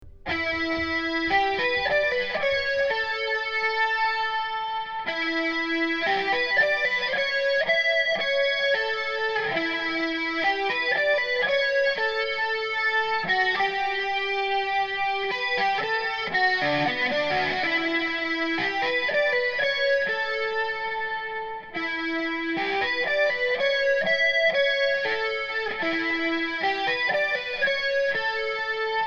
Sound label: Memories guitar; Film Classical